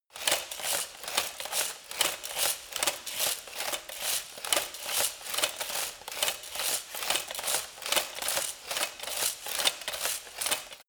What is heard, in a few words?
light walk fast sequence